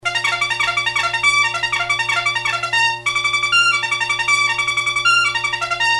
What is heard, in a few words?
REVILLE; army; bugle